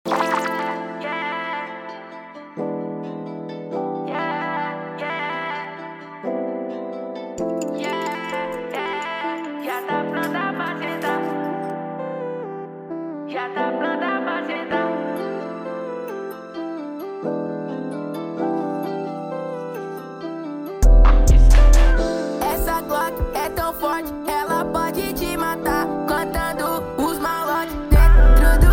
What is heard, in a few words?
beat